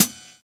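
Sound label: pedal hi-hat, Roland MV8800 drum kit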